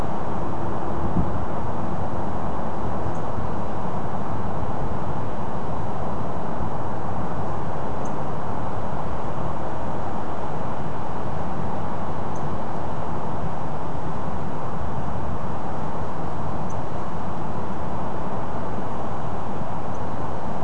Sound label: Little Bunting, nocturnal flight call; Beijing nocturnal flight call library